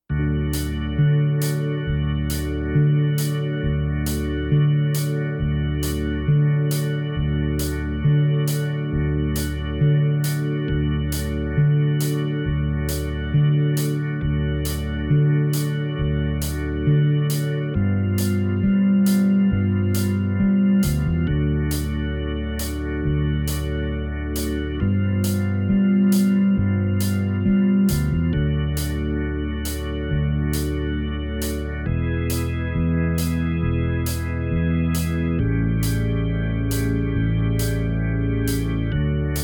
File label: Backing Track